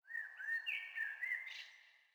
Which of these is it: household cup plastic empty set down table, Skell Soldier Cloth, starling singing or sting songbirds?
sting songbirds